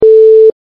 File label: notify